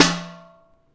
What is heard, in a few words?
armour hit; AoM-ARTofMyth